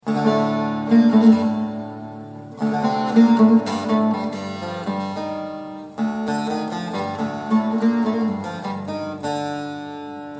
saz

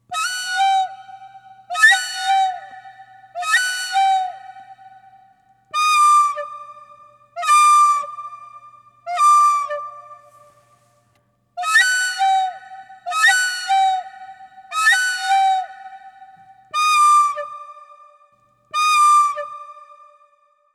reclamo

